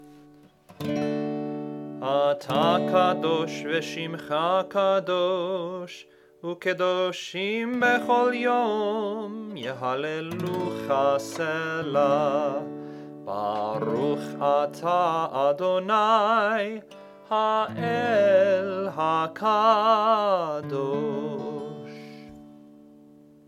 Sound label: K dushat Hashem Low Voice; Hebrew language